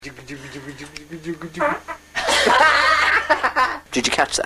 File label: jugga, farts